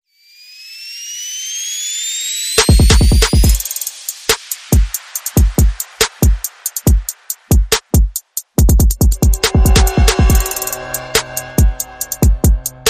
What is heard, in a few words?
Perc and FX